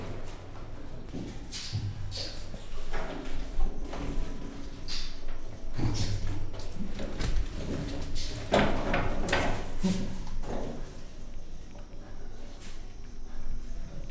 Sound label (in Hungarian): mozgasban kopkodes szellent, csimpanz; gyori zoo 2014